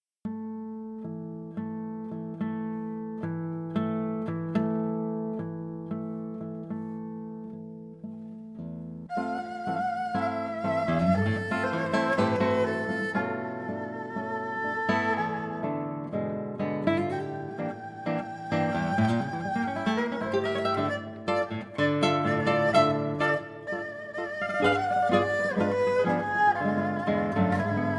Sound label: Tango